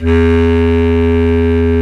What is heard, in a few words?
Bs Clarinet, Orchestral Winds; Lo Clarinets; Roland LCDP04